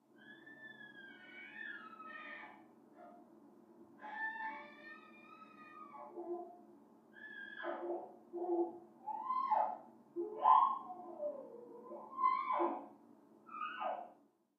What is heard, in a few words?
Baby; Happy And Excited Squeals And Laughs, From Down Hallway